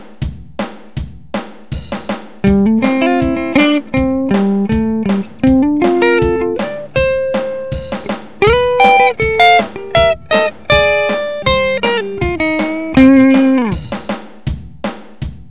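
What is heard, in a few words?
country dry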